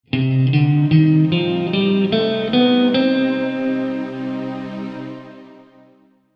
Escala de Do Menor Melodica

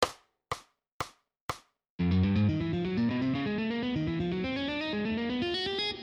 salto de cordas 120BPM; guitar

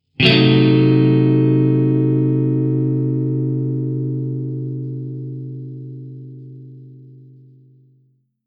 Montagem de Acordes Tetrade

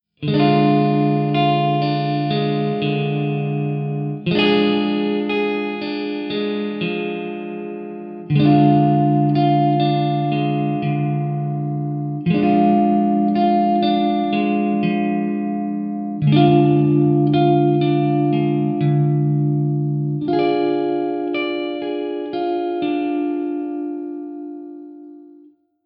Acordes de Triade Diminuta, guitar; Montagem de Acordes

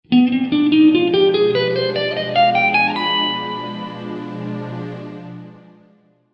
Escala de B Diminuta Harmonica

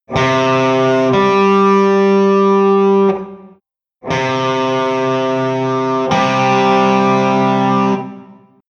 Intervalo de Quinta Justa, guitar

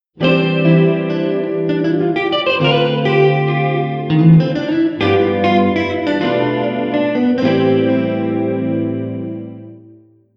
Cadencia Em7 Dm7 G7 Bm7b5 Am7

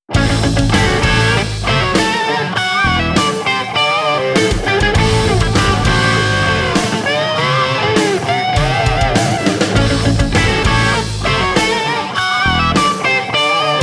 Blue Tone Solo do Riff, guitar

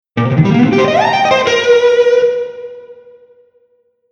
Tetrade de Cm7 Arpejo, guitar

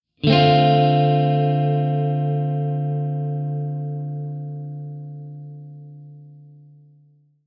Acorde de Tetrade de Dm7

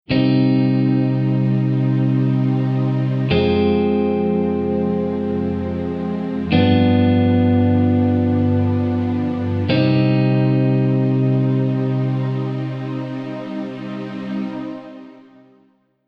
Progressao Cm Fm G7 Cm

